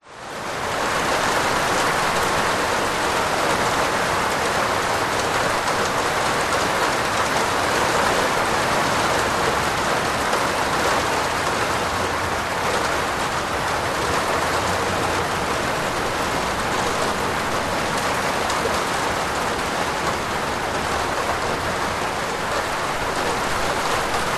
rain on plastic veranda roof occ traffic pass by and birds; nature